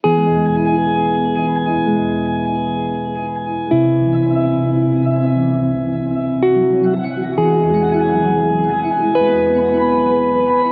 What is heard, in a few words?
Guitar; Prism Shift; Diamond Shine; H90